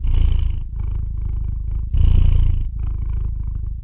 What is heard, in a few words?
125 bpm deep cat purring beat